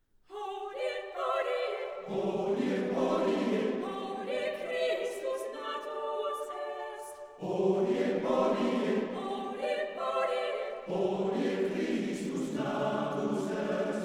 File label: Fanfare for Christmas